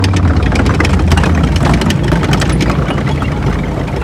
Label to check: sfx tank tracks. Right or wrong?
Right